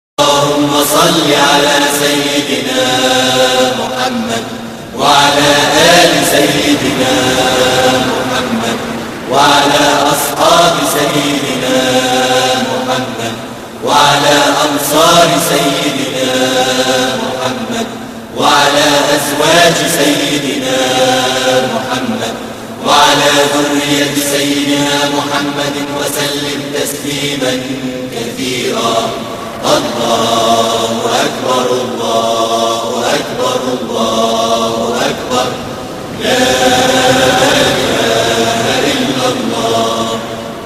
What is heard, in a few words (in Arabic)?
نغمة تكبيرات العيد; tone; Holiday decorations